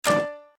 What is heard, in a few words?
btn Standard toggle Music; slots; Pirate Quest